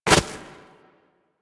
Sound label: Walk Snow, Footsteps; Warframe; Operator